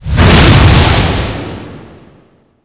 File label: beamgun